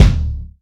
wet steel kick drum sound D key

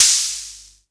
thin open hi hat g key; Duration 0:01; Samplerate 44100 Hz; Bitdepth 24

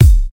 short hip hop kick drum sample c sharp key

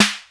natural snare drum sample f key; snare drum samples

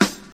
old school full snare single hit g key; snare drum samples

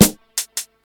large room snare sample c sharp key

